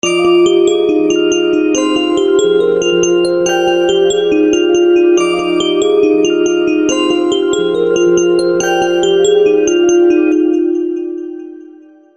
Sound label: Standard Bells